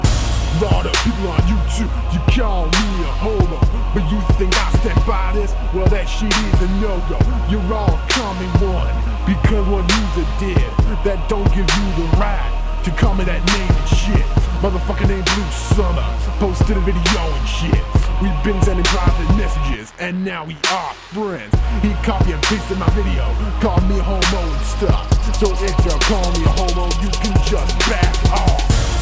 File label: Gangsta Rap